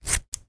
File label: cutting hair scissors single cut; foley; zapsplat